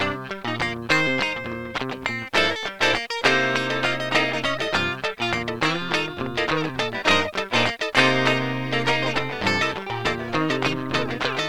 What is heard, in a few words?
funk Unprecise